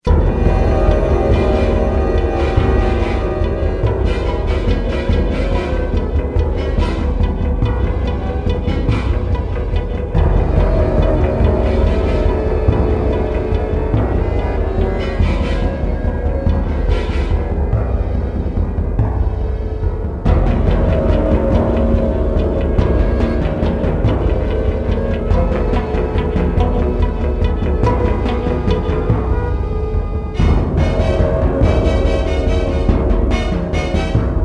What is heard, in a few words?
fast, music; Soldier Of Fortune II Double Helix; XBOX; kamchatka